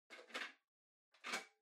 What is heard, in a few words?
Portable Stereo Far Tape Insert Close